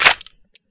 rifle mag out

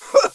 Female sword ready

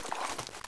m4 mag

